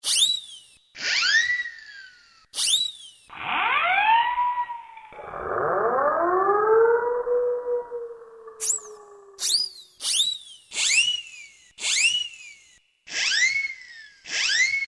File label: ZING, Roland SN-U110-02 Latin And F.X. Percussions